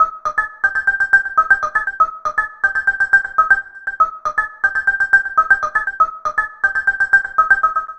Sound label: Gel Riff, Sequences; Electronica